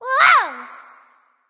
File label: fear; cupid